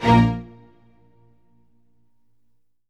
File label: ORCH HIT A#; Q Up Arts; Denny Jaeger Private Collection